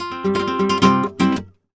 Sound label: Guitar, Flamenco, c; Best Service ProSamples vol.46 - Flamenco; Frase